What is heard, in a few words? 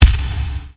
Kick